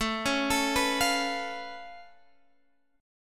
C sharp Augmented 7th A, chord

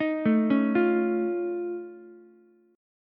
F Augmented 7th F Ukulele, chord; F+7 arpeggiated; JGuitar

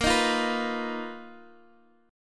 B Major 7th Flat 5th Bb, chord shape

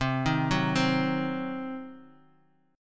C Diminished C, Guitar 4F,4A,5C,5G,5C,6E; arpeggiated; JGuitar

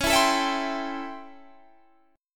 A 7th Sharp 9th

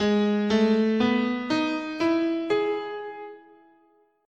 Ab Balinese Pelog, scale

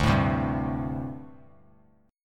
D 7th Suspended 4th, down; strummed; JGuitar

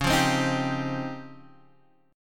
Db Major Sharp 11th, Guitar Half Step Down

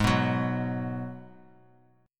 G sharp Minor 7th; strummed; x,11,9,11

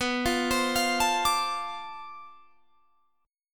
B Minor 7th Flat 9th Flat 5th B, guitar chord

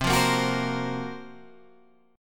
Gb Major 7th Flat 5th

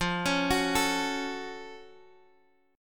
F sharp Minor Major 7th F

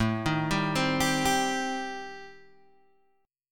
A-Minor 7th Flat 5th, Guitar Open G, chord

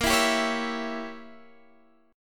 E Diminished 7th Bb, guitar

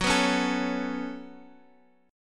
C sharp 7th Suspended 4th Sharp 5th, F sharp

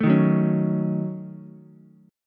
D sharp Minor 7th Flat 5th D sharp, down, Ukulele; D#m7b5 strummed

